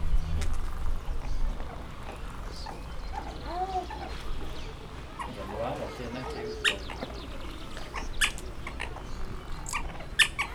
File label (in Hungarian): jobb csatornában szellő, prérikutya; pecsi zoo 2017